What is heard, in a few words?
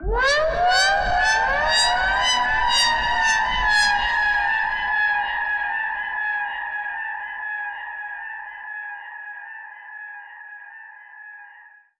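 Modulation Proc, alarm siren samples, 120bpm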